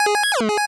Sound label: 8bit se